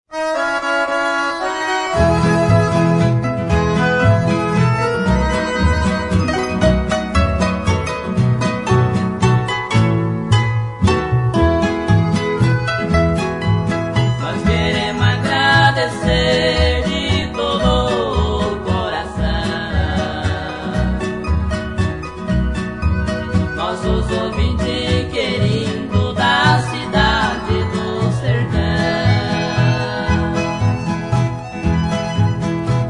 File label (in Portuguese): Viola Dourada